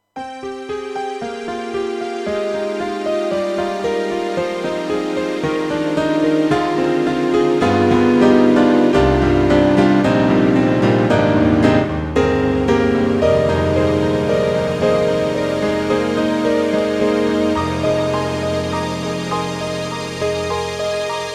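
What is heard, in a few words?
YAMAHA MU500 Piano Strings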